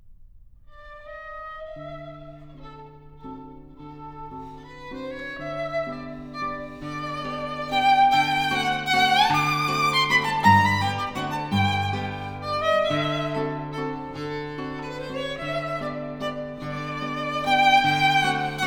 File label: for piano Walzer